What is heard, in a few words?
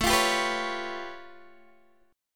A Augmented 9th A Guitar DADGAD